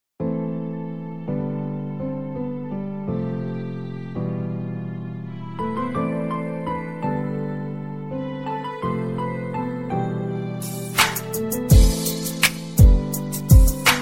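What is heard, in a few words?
touching instrumental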